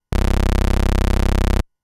Pulse A C0; fleet keys Samples

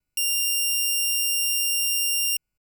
Pulse B F6, keys; fleet keys Samples

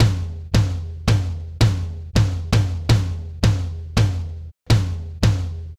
clack bnc

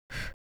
Short exhale; VOCALOID6 AI Megpoid SOLID Voicebank; Vocaloid 6.5.1

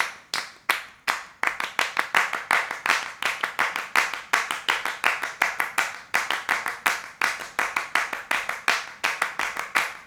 claps

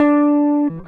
gtr trem

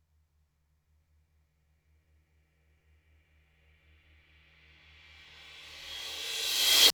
Crash RVRS

